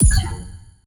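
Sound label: Design misc Kill Confirm Metallic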